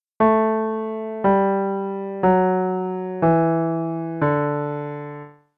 DOWN Bass D maj

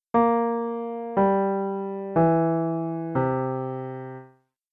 Bass C7 down

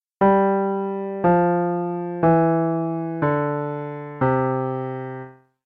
DOWN Bass C maj

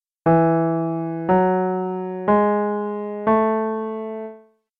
Bass up E Maj tetrachord up

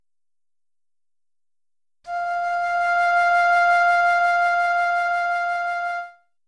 high B6 oboe

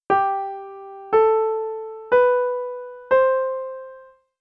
Treble G Maj tetrachord up

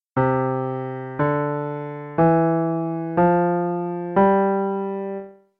UP Bass C maj

